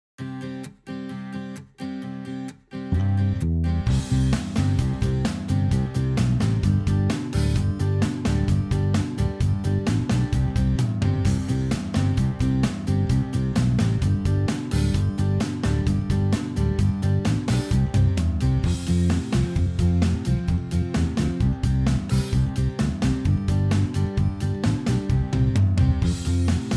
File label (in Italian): Aria Strumentale